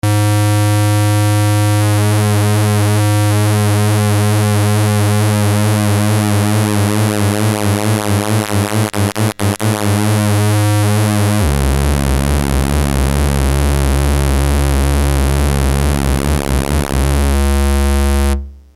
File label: PWM; Buchla